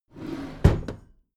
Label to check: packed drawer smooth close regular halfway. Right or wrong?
Right